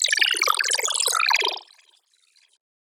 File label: SFX Robowater; One-Shots; Ghosthack; Color Bass Essentials